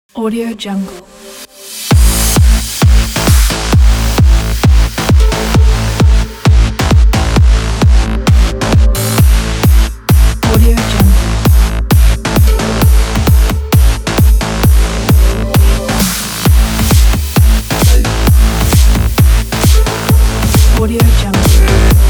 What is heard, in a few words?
power bass; alpinasound